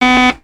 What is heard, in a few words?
Beep Intercom